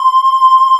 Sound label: MATRIX PAD; Vintage Keys; E-MU Formula 4000 Series Vol. 3; Analog Odyssey